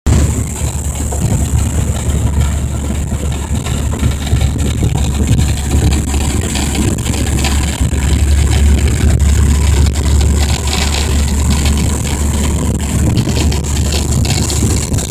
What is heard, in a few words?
noise over 50 60 mph, camry